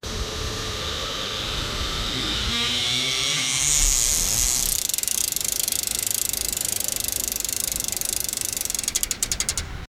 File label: bikes tyres screeching